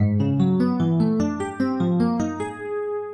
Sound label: 12-tone row; 1-interval; intervallic runs